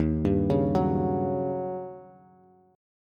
Eb 7th Sharp 9th, Eb, Bass; Eb7#9 arpeggiated; 11,10,11,11; JGuitar

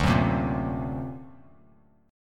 D Augmented 7th Bass Standard, chord; D+7 strummed; JGuitar

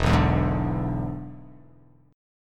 E 7th E down

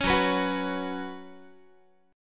Gb Suspended 4th, Guitar; strummed

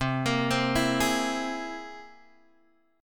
C Augmented 7th, Guitar, Open G

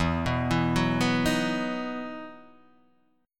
E Major 7th Suspended 2nd, Guitar, Half Step Down

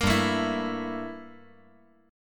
G Minor 6th Add 9th G down

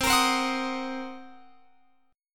C Minor 7th Flat 5th Guitar Open F, chord; Cm7b5; strummed

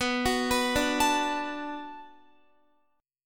B Minor 7th B Guitar, chord; Bm7 arpeggiated

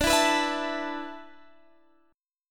D 6th Suspended 2nd D, chord

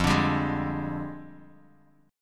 F Minor 6th Add 9th F down Bass Standard; JGuitar

